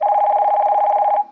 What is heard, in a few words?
ring